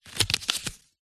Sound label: household book minature page turn; zapsplat